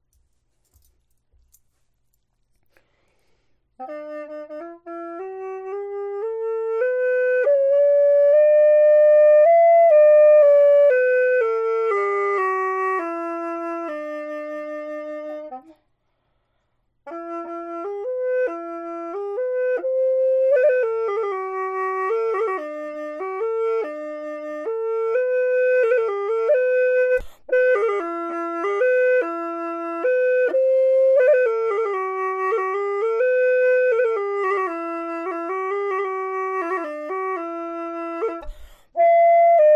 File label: bawu